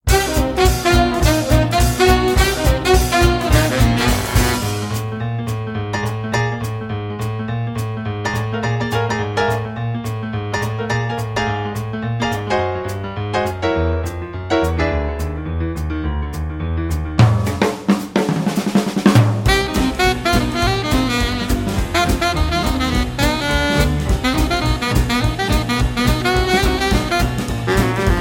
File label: Carnival Kerfuffle